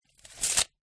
industrial tape measure metal release retract loud tape noise; zapsplat